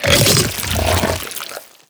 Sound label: bite digest, barnacle creatures; Half Life Alyx